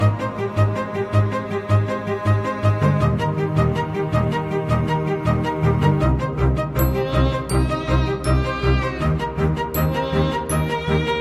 violin; gravity falls

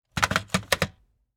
Phone Hang Up

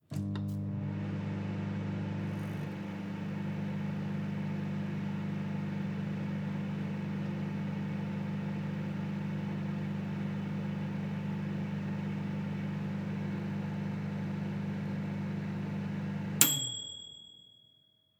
Microwave Oven

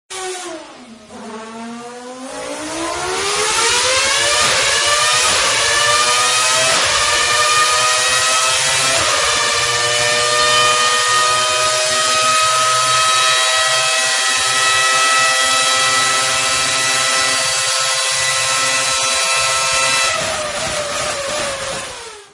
formula 1

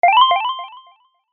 ALERT

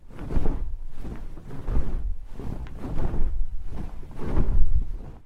flapping wings

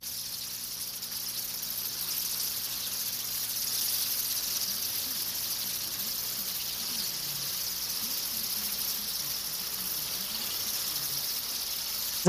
bats vocalizing

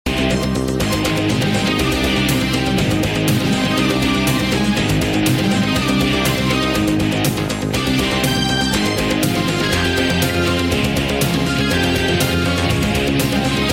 cartoon; x men

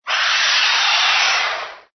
ANIMAL CREATURE

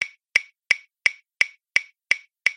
metronome metronome

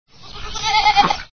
GOAT

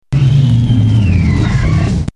velociraptor; jurassic park

